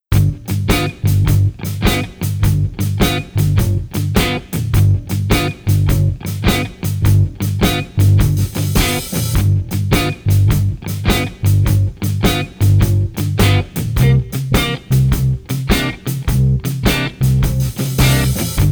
Guitar Solid Blues no Lead 104

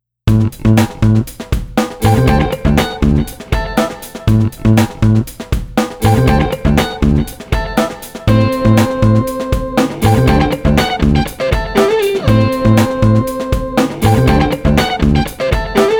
Guitars Funky Quartet 120

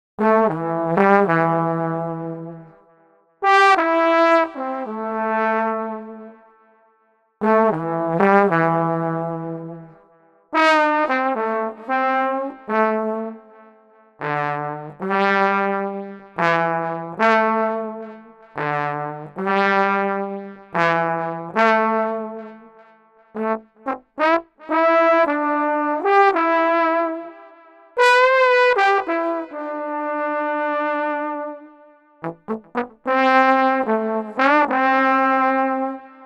Street Trombone Lethargic 110

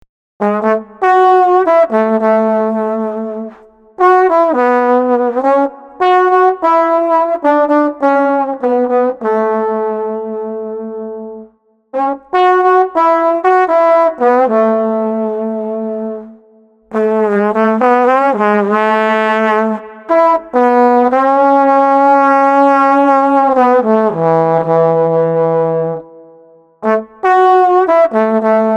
Street Trombone Lazy Day 80

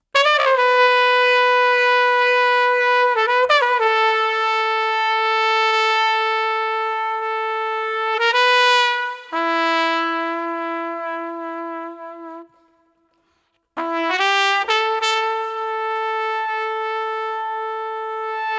Street Trumpet Somber 120

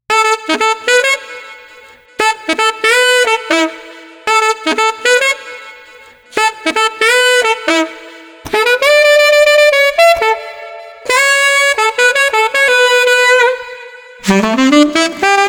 Street Alto Sax Enthusiastic 115

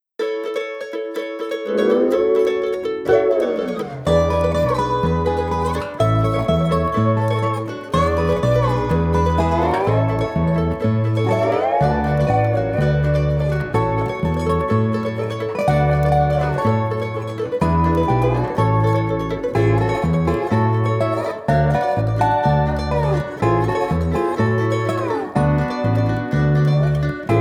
Luau No Drums

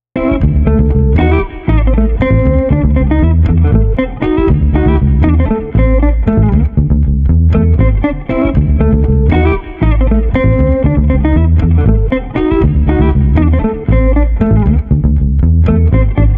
Street Guitar Bass Funky Groove 118

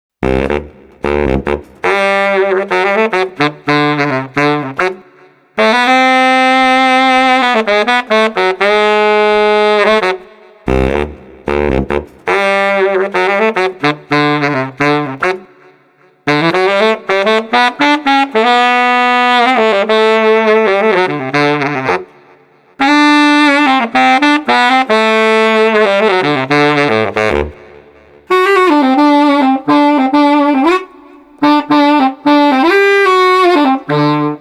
Street Baritone Sax Melodic 115

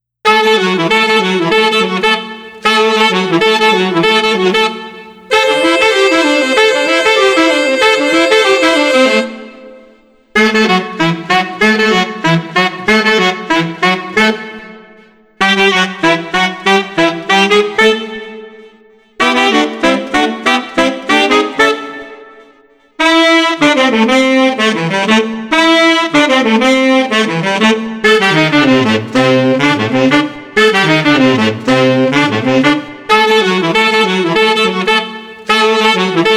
Brass Combo Upbeat 95

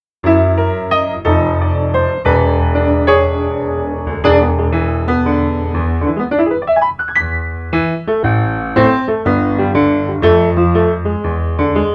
piano only 120

